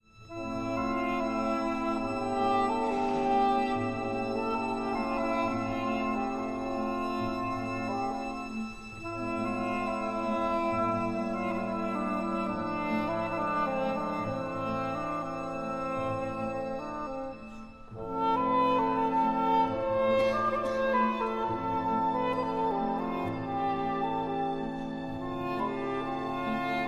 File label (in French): air oriental